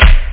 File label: bdrum